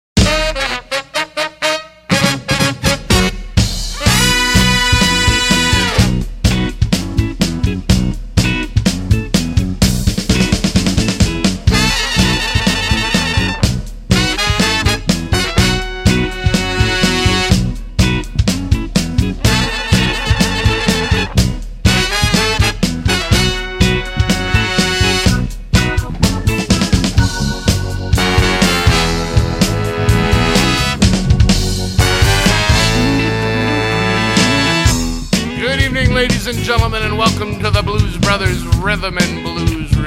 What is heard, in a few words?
Tribute Band